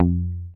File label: HOF MUT, Hofner mute bass